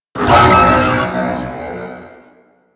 Pain Growl B; Enemies; Vocals; Warframe; The New War; Archon; Amar

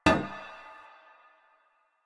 Stone Cafe Table Impact; Warframe; NineteenNinetyNine

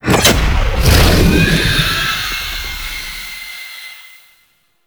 Gargoyle Activation Stinger; Entrati Labs

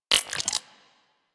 Shotgun Mech; Weapons; Revenant; Warframe; Tenno

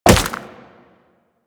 Wall Jump Ice; Footsteps; Warframe; Tenno; Wall Run

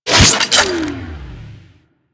Microwave Pistol Stop; Grineer

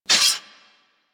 Melee Impact Metal; Warframe; Weapons

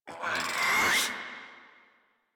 Infested Charger Run Breath; Warframe

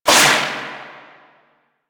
Void Projectile; Weapons; Warframe; Duviri; Incarnon Adapters

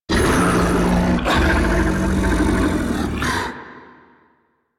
Octopede Idle; Warframe

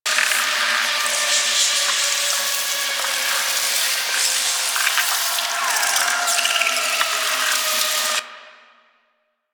Glyph Puzzle Void Disc Loop; Warframe; Duviri

